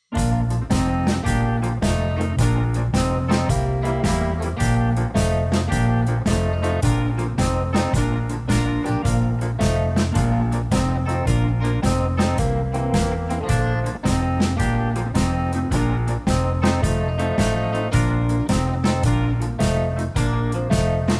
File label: accompagnement Bb